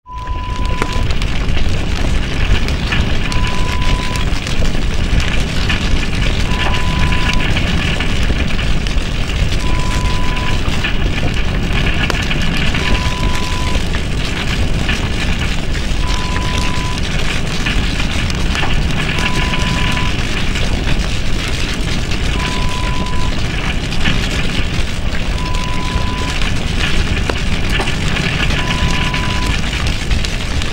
Hell s Fire Horror Sound Effect

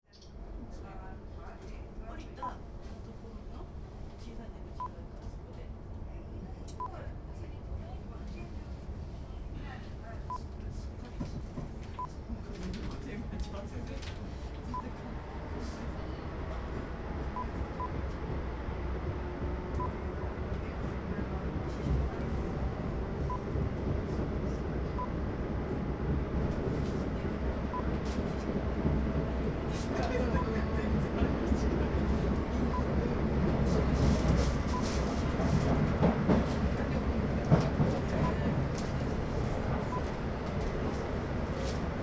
Modern Train Ride Interior London

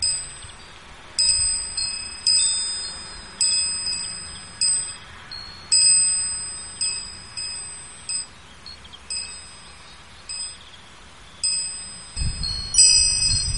wind chine stone

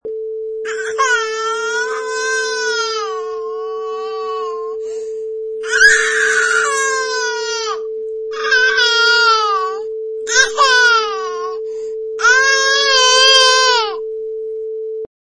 Baby Crying

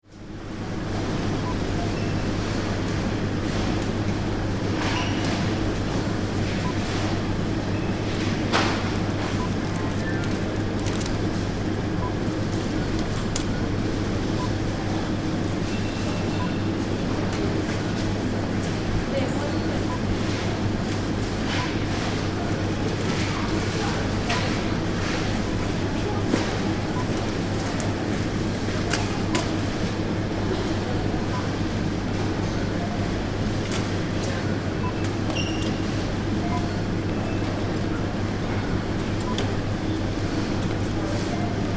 Super Market Ambience Vegetable Aisle; Tesco

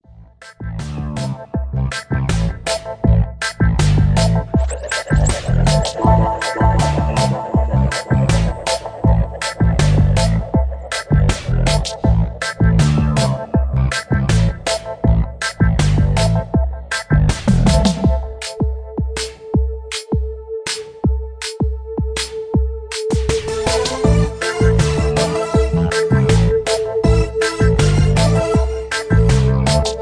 Alien Reggae

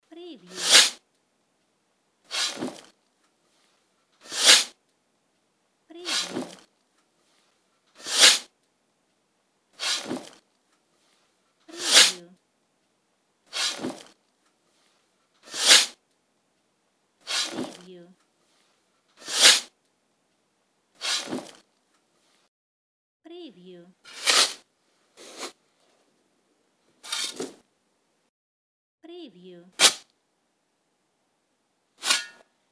Spade Digging Ground

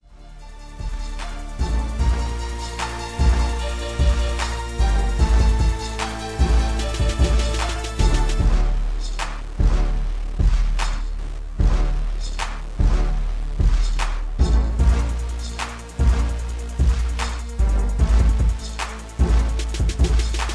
75BPM

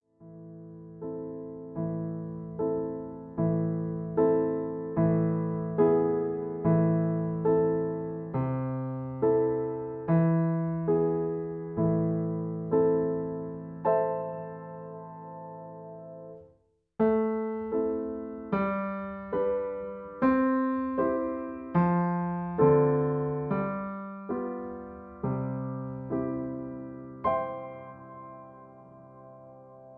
Accompaniment A

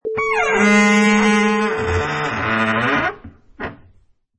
Creaky Door

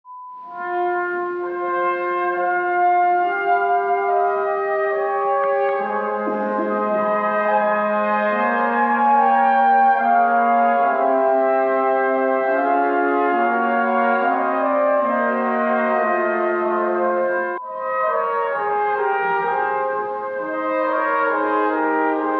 Trumpet Concert sound

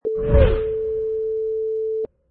Wipe Long Tail

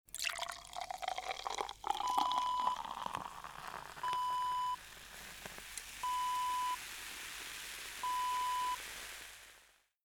soda pour

